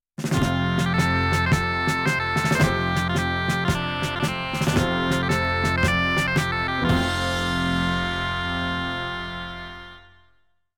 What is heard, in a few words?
Scottish, jingles